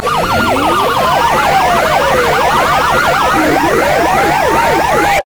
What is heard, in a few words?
vi r dash